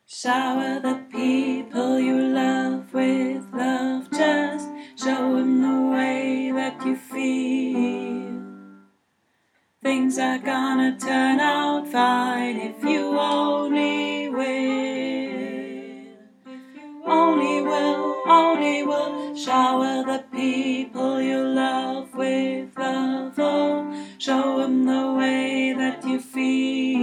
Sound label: Alt 1